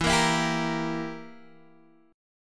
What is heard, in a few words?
E Diminished down Guitar DADGAD